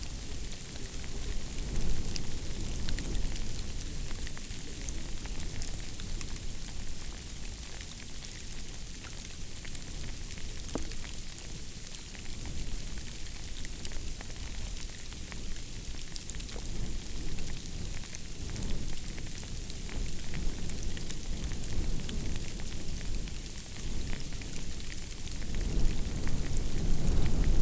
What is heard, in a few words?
sleet hail; calendaria